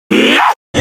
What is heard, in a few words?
yiheahh demonic